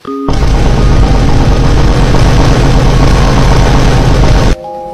vine boom chain

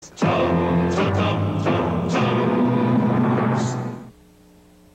jingle; tums

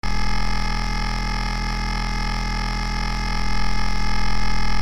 glitch

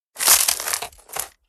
branches snapping

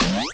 doink

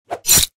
knife slashing

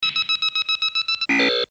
lock on

